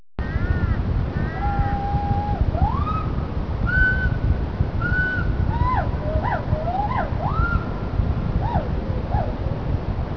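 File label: hoolock gibbon male; Solo song, solitary adult male, Kunming Institute of Zoology, China, Oct. 1988; rec. Lan Daoying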